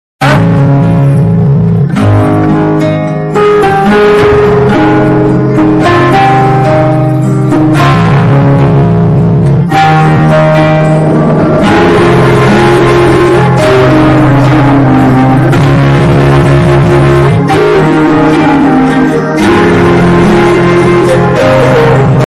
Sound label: heroine entry bgm ringtone; naa saami ranga movie